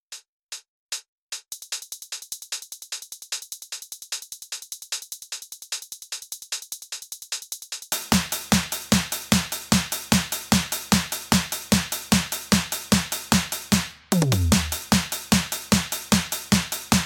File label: Rabbit Percussion